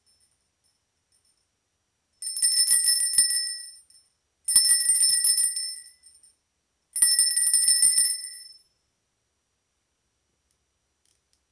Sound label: bell sound